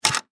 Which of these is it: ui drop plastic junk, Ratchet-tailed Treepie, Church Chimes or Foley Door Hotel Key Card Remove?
Foley Door Hotel Key Card Remove